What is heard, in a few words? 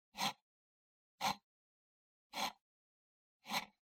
PC Mouse Move Mid